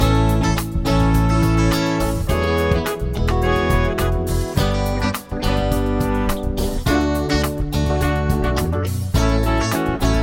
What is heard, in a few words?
chord prog with mixture